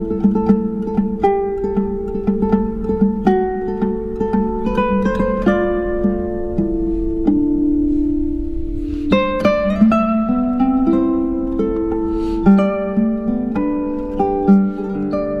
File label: guitar